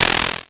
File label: Multimedia Internet CD-Rom Flash Click Multiple